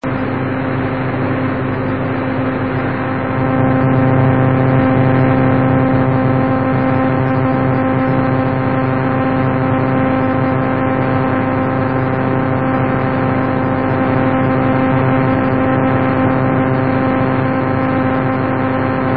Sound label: ANS Astronomical Netherlands Satellite; 30 OCT 1974